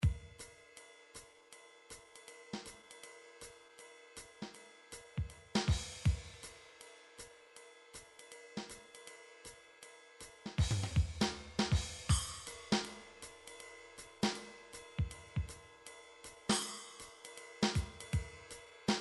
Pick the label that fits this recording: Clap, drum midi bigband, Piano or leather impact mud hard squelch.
drum midi bigband